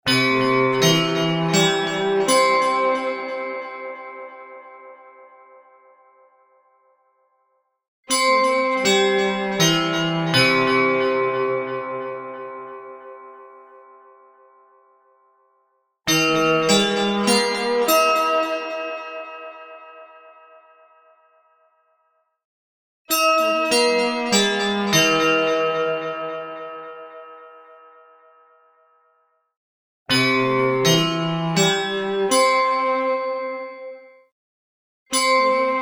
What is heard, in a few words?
announcement chime